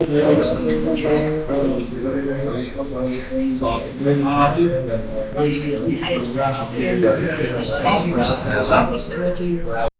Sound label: soft background chatter o